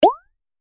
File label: Gentle Menu Reminder